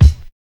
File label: combi drums